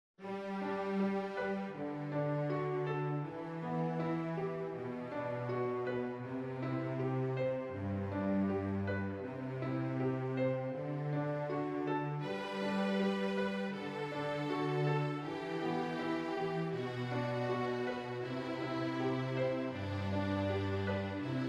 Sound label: Junior Strings; Jolly Old Saint Nicholas